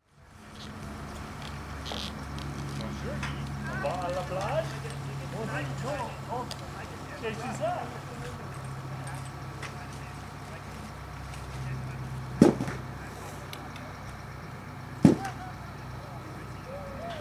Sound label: Sounds Outside Two Elk On Snowy Afternoon; Vail